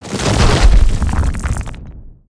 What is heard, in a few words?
dirt crumble explode; creatures organic